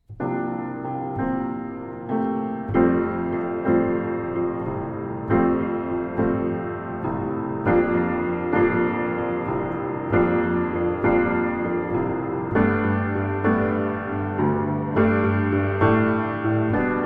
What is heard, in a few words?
Instrumental; theater